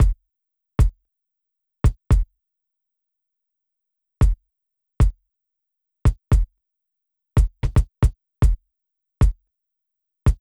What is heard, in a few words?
Kick Proc With Sample